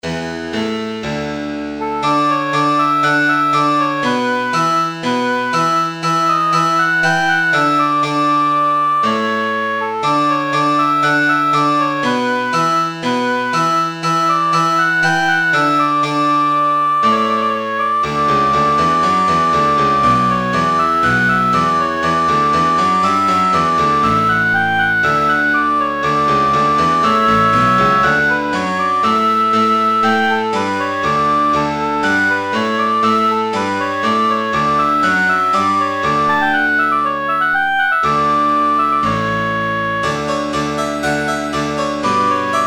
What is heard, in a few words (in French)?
amusement pour hautbois clavecin